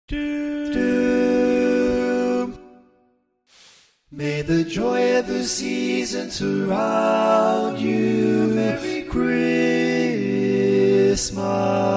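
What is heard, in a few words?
Baritone, barbershop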